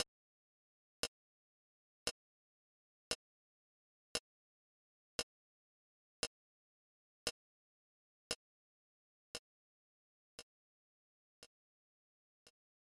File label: Clock